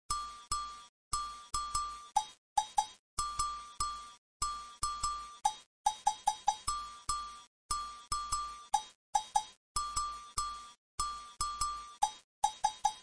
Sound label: funk